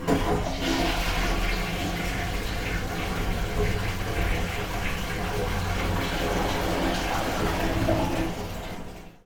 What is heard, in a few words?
toilet